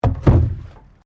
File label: PLASTIC BARRICADE IMPACT; Material components for constructing more complex sounds